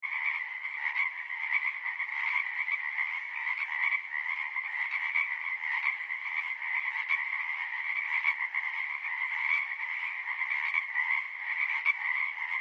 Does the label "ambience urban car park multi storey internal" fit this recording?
No